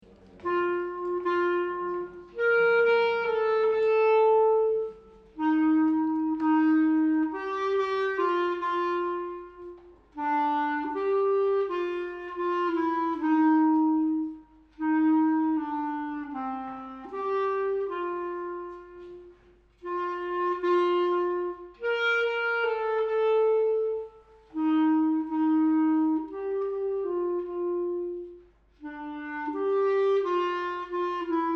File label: santa lucia clarinet